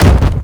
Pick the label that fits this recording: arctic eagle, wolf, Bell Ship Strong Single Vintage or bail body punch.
bail body punch